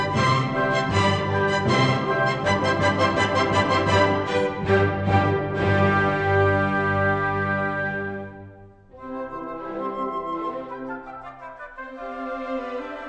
classical

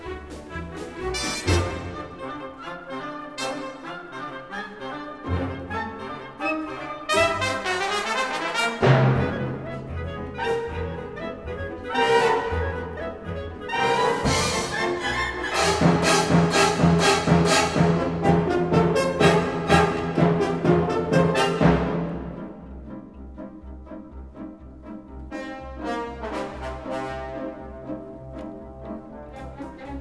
jazz

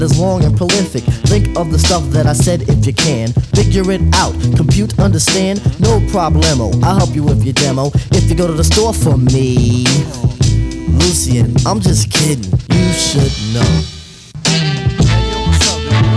hiphop

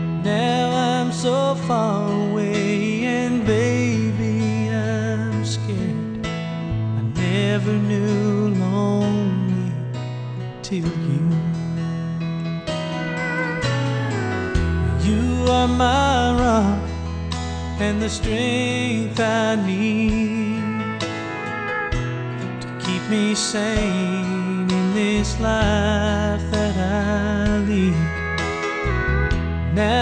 country

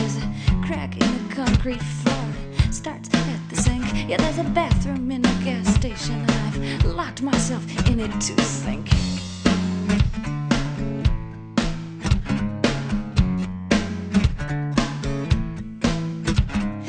rock

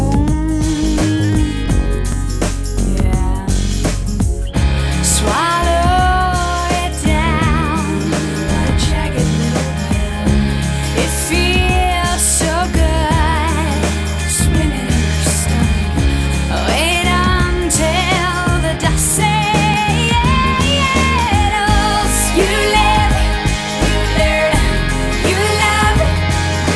pop

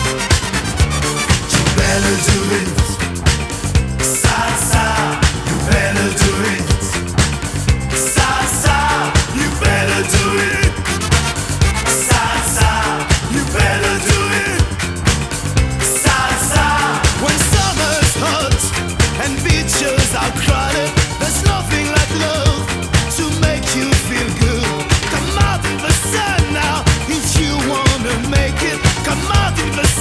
disco

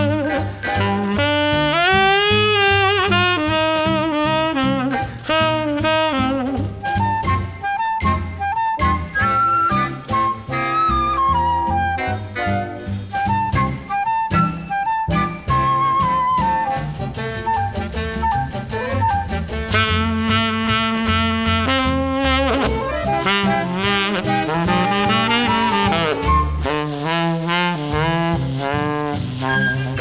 jazz

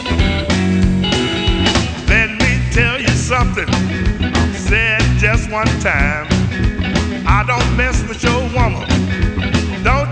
blues

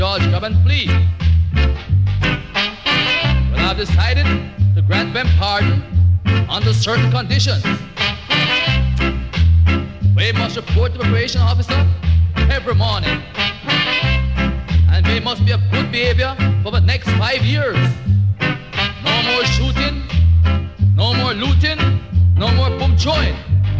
reggae

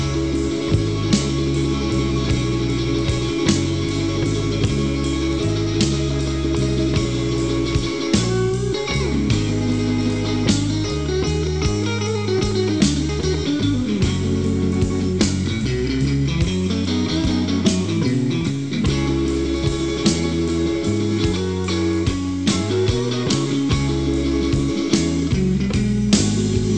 blues

